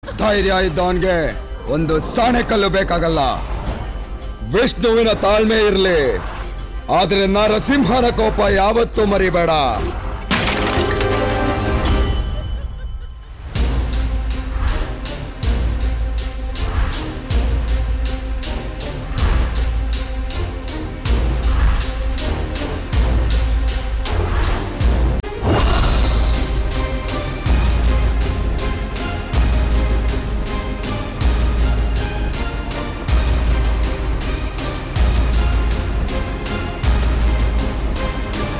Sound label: Interval Bgm Ringtone; Ugram; Kannada